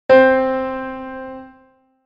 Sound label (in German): Intervalle simultan